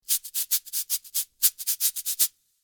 son cabasa

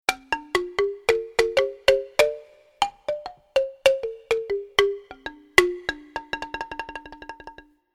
son balafon brico

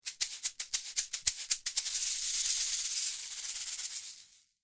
son banane

